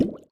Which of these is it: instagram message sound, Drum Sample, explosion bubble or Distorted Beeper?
explosion bubble